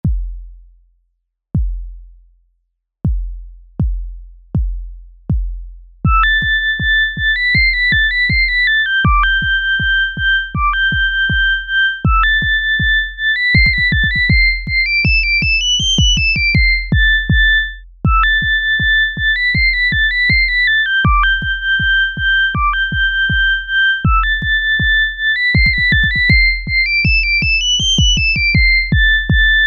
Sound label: 80bpm with doubling; Paddy On The Hand Car